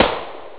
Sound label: missile